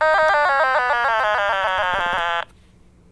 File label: otamatone